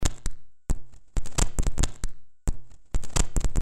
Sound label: loop klicker